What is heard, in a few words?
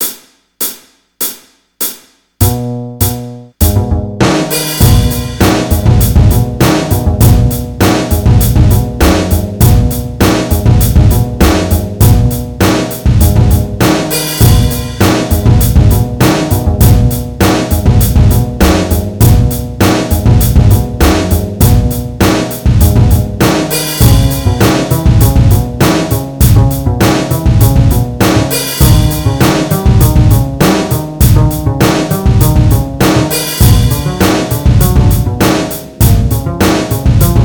groove